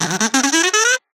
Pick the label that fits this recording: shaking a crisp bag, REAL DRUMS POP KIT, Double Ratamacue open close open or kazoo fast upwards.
kazoo fast upwards